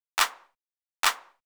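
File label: PERCUSSION ELECTRO 140, Techno Drum; Techno2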